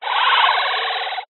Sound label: science fiction transmit error burst; zapsplat